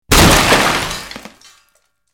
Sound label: Rock Hits Metal Debri; rock impacts